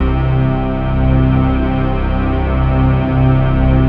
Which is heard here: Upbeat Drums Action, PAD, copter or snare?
PAD